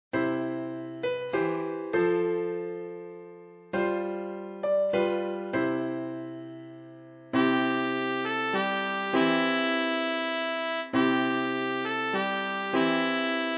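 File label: trumpet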